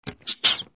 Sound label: Air compressor